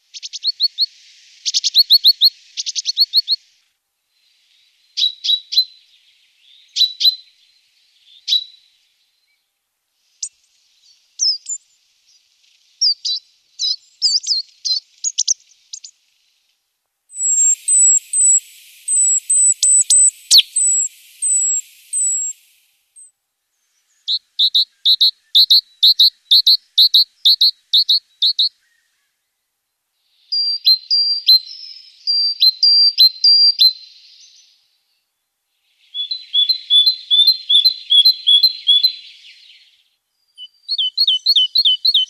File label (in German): kohlmeise, tierstimmen